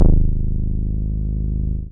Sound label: BAS MINI, MOOG; Sound & Vision - Gigapack II CD 1